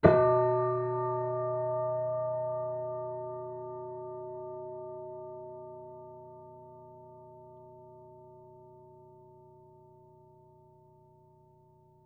Piano Harmonics, Harm Low Mid

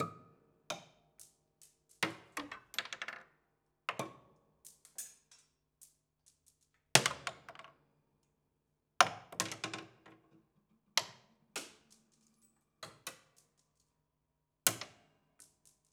Marble Lazy Bounce, Piano; Piano Destruction

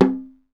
Snare Drum; Percussion Samples

